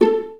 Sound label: VA PIZZ A2, Orchestra; Best Service; Peter Siedlaczek's Advanced Orchestra